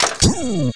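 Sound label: boost speed; PC World Komputer 1998 May; rrdemo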